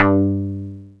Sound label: BASS DECAY